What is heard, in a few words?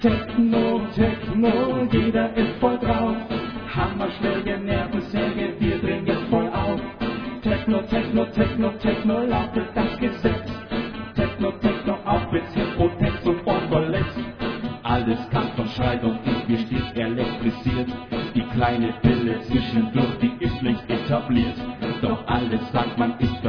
Techno